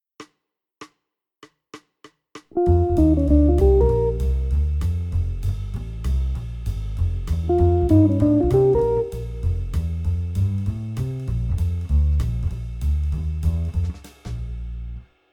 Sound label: V7 (Fast)